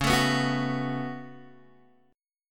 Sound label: C sharp 7th Suspended 4th Sharp 5th C sharp, Guitar Open G